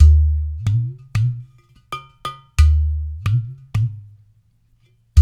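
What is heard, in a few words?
93 bpm, udu calypso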